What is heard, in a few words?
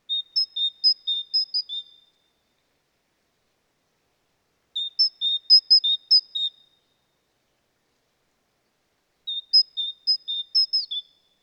Parus major; SETE